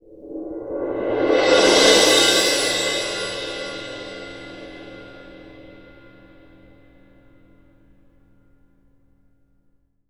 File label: CYM CRS-R, Synclavier Percussion; ILIO; Synclavier Percussion 2; World & Orchestral